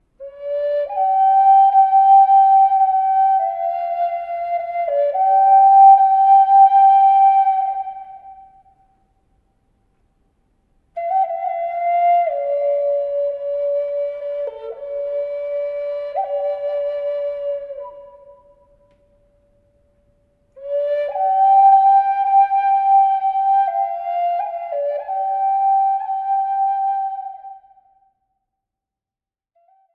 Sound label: Zuni Sunset, indian flute